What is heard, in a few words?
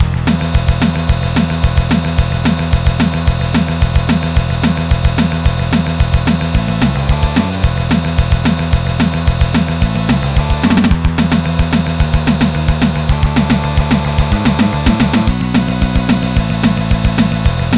music